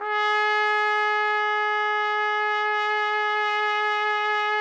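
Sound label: trumpet; Roland - LA Composer vol. 3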